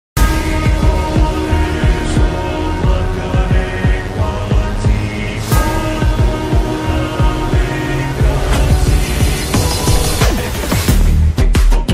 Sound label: bgm ringtone; leo; antony das; sanjay dutt; thalapathy vijay